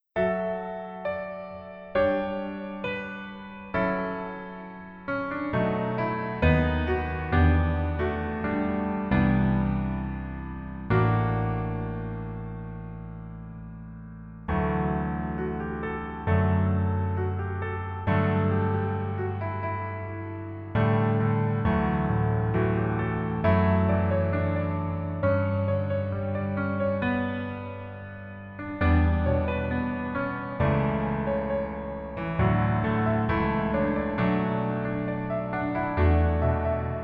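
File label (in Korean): Piano version 멜로디MR 남자키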